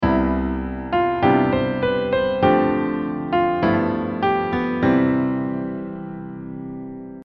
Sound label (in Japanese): スケールで考えるメロディー